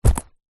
footstep single shoe business leather buckle zipper womans on carpet; zapsplat